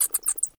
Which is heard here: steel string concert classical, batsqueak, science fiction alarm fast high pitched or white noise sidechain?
batsqueak